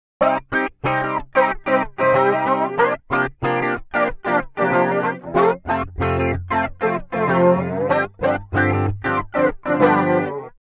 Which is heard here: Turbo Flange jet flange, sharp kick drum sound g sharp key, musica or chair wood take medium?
Turbo Flange jet flange